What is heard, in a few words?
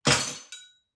Glass Break; SoundsCrate